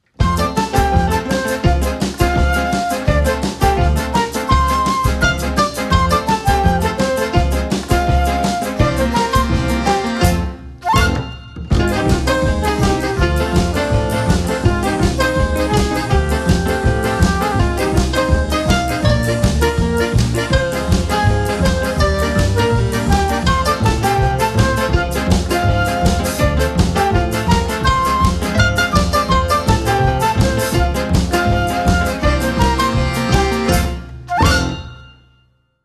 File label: fanfan